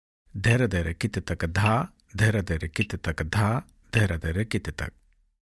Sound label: Dheradherakitataka Dhadheradhera